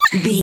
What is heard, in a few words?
voice fun creature small mutant voice emotes